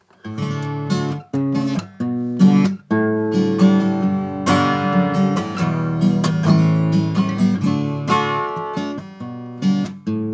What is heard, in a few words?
Acoustic Guitar